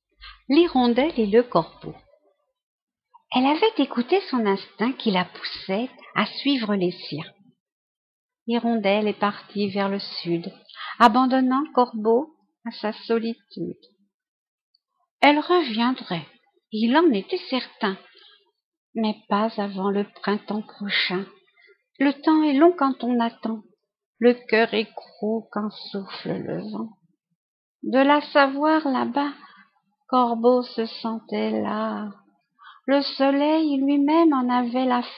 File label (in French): corbeau